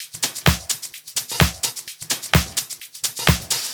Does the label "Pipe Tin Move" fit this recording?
No